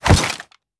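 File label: melee attack gun large; viper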